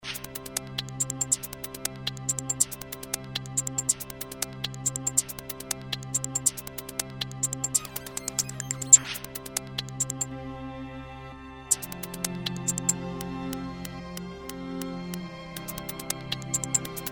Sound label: instrumental; 2006 glitch instrumentals